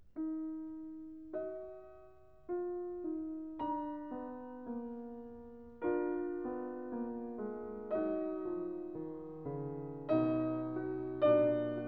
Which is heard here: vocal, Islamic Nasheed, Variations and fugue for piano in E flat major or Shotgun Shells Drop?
Variations and fugue for piano in E flat major